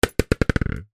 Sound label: motorcycle helmet down on ground bounce; transport